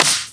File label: Clap